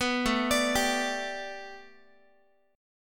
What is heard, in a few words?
A 7th Suspended 2nd Suspended 4th, Guitar; A7sus2sus4 arpeggiated